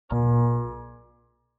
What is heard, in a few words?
TV Degauss Short